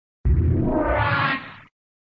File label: Sound SFX, flipper